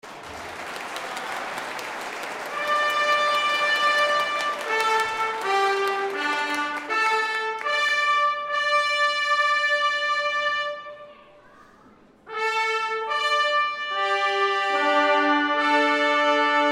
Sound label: Fanfare; NCAR